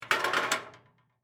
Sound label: Medical Scale, Emergency, Medical, Scale, Move, Weight; Hospitals; BlastWave